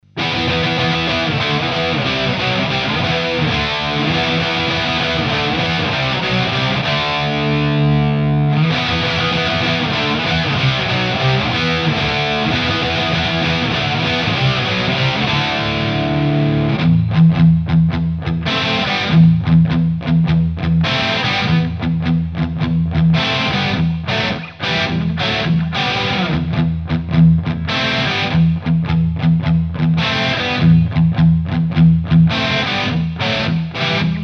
ADA Mp1 Classic, Gibson R8, preamp; Canal Brown

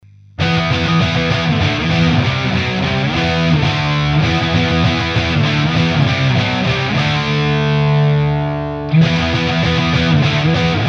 Digitech RP255, PRS Custom 22, Preamp

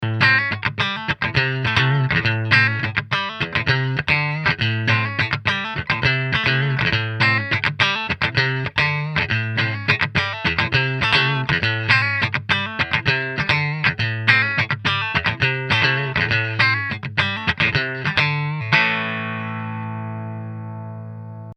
Eminence V12 Clean

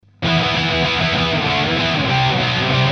ADA Mp1 Classic Canal Brown, Charvel SOCAL

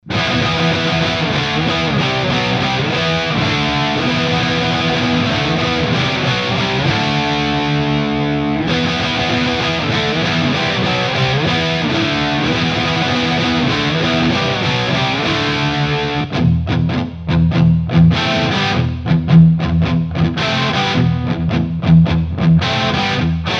Egnater M4, ComparatifPreamp; XTC; Charvel SoCal

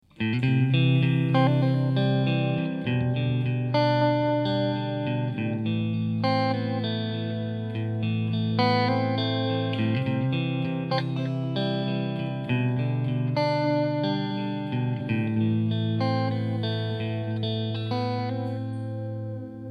Clean Strat

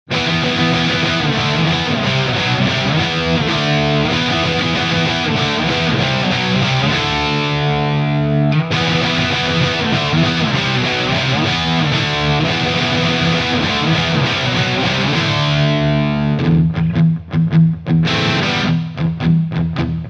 Egnater M4 SL2x Canal A, Gibson R8

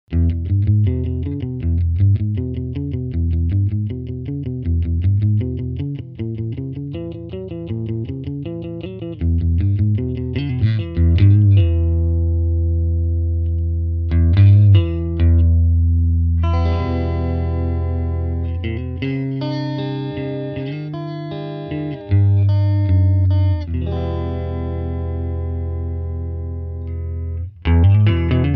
minicombo clean

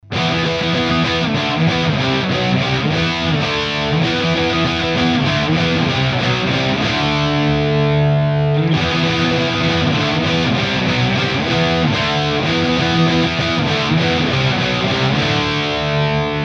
Egnater M4 SL2x Canal A, PRS Custom 22

